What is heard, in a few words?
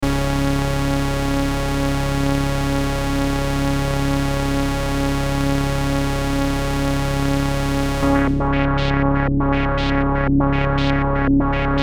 Synth; ARTURIA FILTER MINI